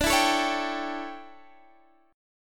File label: D Major 7th Suspended 2nd Suspended 4th, chord